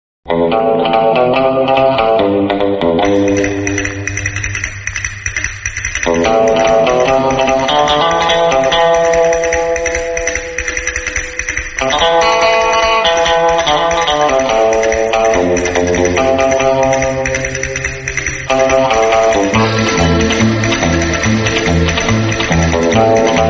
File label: music bgm; ghost in the shell